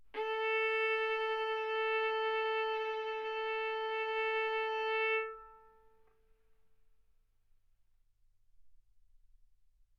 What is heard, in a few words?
Violin arco A4 B4